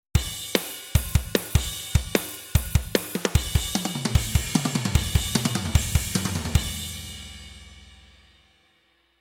not brick walled drums ambiance added, No Brick-Wall Compression, Ambient Mic Signal Added; adding the signal from an ambient microphone into the mix